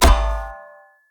Great Sword Catch; Weapons; Swords; Dendrit Ara Great Sword

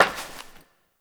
Step Glass; Warframe; Koumei

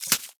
Micro Step Snow; Warframe; Corpus; Arachnoid Micro

